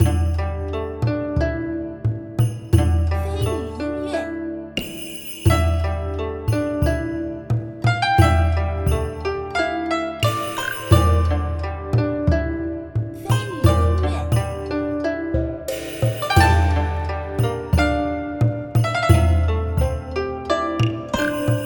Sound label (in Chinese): 中国风 悠久